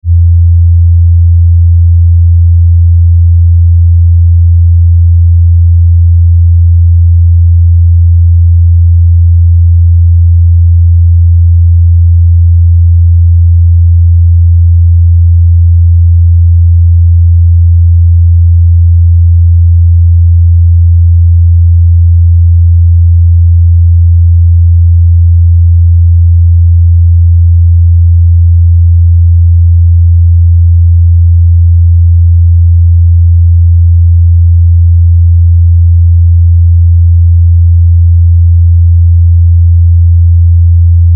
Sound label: 85hz sinewave